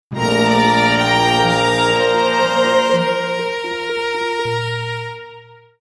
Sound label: Patriots Waltz, Sting